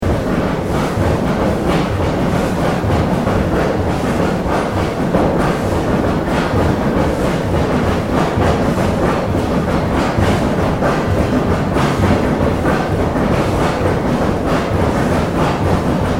Ambience Battery Room Steam Engine Loop, Colonial era steam driven rock crushing; Construction